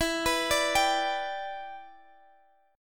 G Major E, chord; arpeggiated

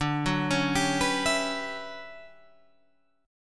D Major 13th D down Guitar Standard; arpeggiated; JGuitar

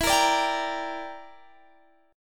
E Minor 7th Flat 5th, Guitar, DADGAD; chord shape

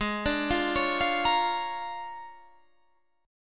Bb 7th Sharp 9th Ab

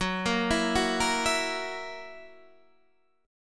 Gb 7th Gb down Guitar Standard, chord; arpeggiated; JGuitar

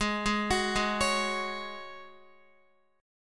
G sharp 7th Suspended 4th G sharp, Guitar; G#7sus4; arpeggiated

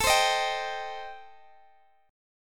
A-Minor 7th Flat 5th, Guitar Open D, chord

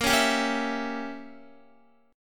Bb Diminished 7th Bb, Guitar; strummed; JGuitar

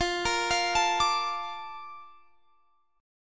Bb Major 7th, F

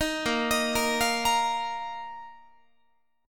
Bb Suspended 4th Bb down Guitar; arpeggiated; tuning 4A,4E,5A,5D,5G#,6C#

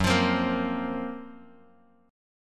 F sharp Major Sharp 11th, Bass Standard; strummed; JGuitar

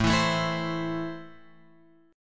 A Minor, Guitar, Open F; Am strummed

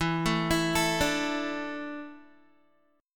E 7th Suspended 4th, Guitar; E7sus4; arpeggiated

